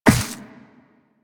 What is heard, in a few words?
Walk Ice, Footsteps; Warframe; Operator